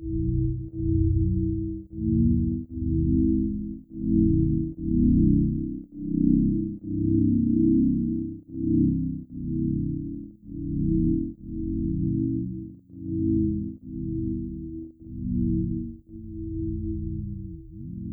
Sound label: mirror music